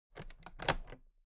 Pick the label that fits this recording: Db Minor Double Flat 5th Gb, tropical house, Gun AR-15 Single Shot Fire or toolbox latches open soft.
toolbox latches open soft